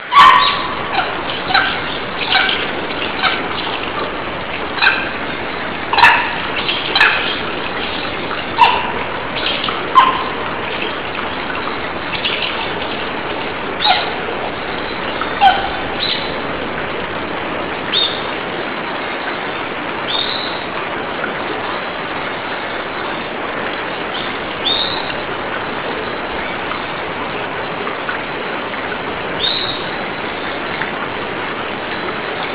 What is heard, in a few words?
monkey; Sensorium; kawasaki